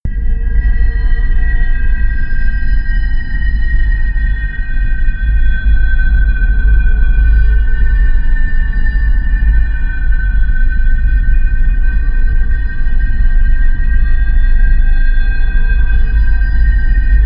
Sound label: drone scratchy siren